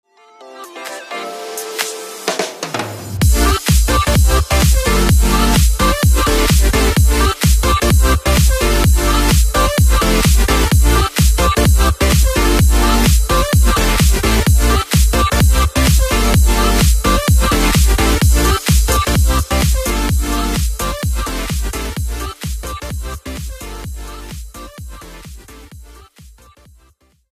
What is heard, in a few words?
electro house